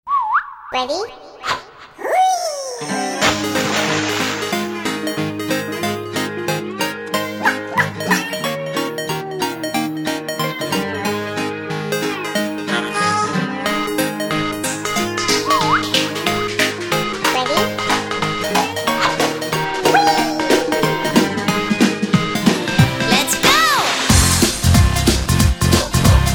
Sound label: Song karaoke